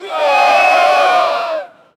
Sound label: a hockey crowd yelling